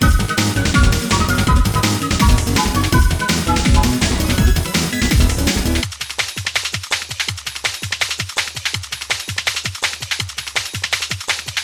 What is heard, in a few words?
Drum n Bass Resonance